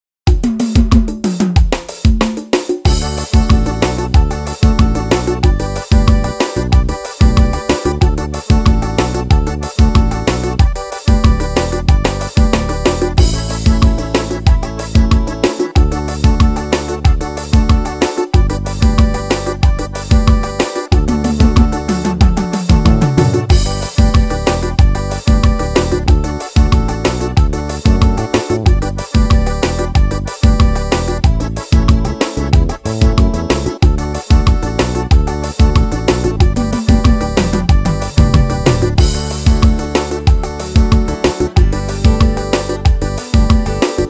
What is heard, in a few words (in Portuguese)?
forro